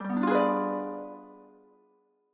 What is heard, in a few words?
harp noise; Undertale